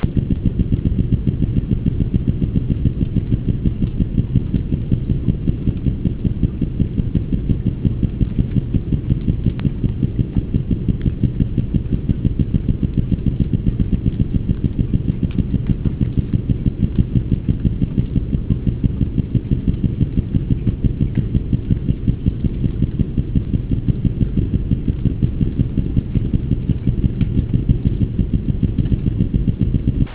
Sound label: Melanogrammus aeglefinus Knock Tank